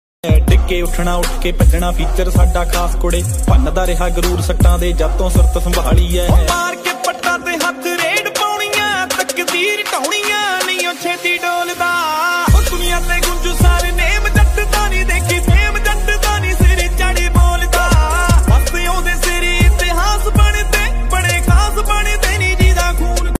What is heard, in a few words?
Desi Punjabi Ringtone